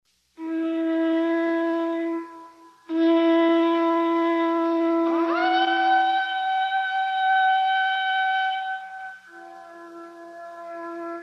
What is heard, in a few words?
Korg DC93